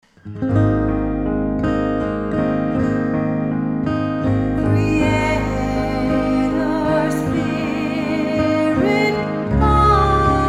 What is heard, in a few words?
hymn; creator spirit